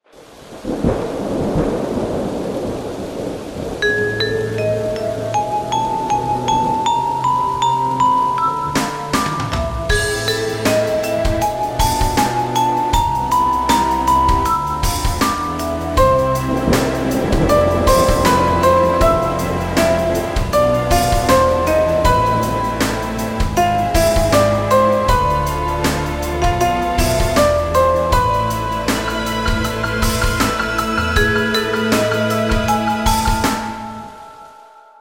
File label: rainy